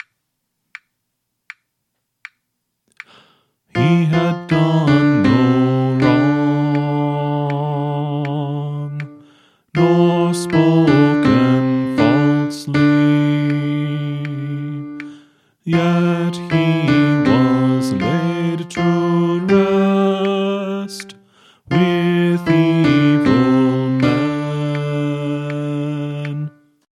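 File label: Song, Choir Practice Tracks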